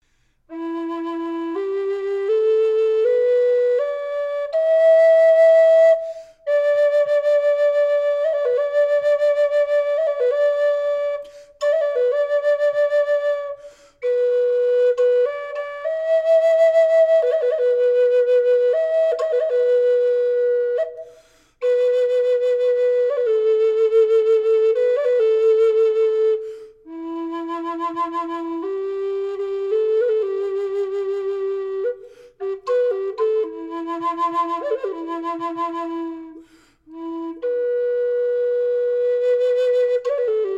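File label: reverb, flute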